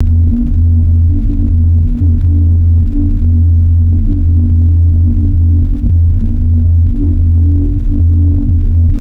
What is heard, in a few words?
oscillating synthetic robot hum